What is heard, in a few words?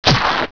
tubercritter hit